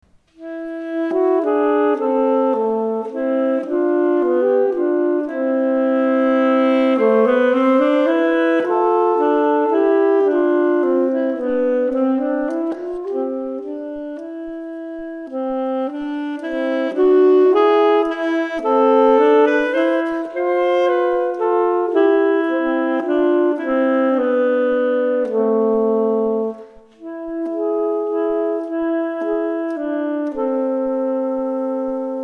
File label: Duet